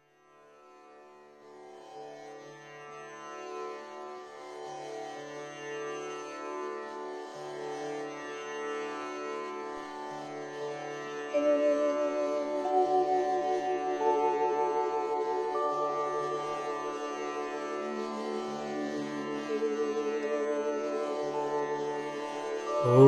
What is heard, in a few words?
Maha mrityunjay mantra